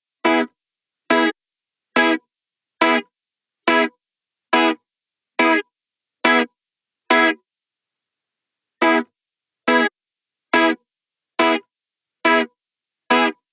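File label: 140BPM E major Guitar DRY, Offbeat Dub Guitars; Antidote Advent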